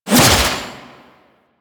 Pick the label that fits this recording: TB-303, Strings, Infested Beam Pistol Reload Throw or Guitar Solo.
Infested Beam Pistol Reload Throw